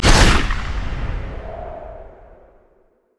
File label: grand skyfall jump; pantheon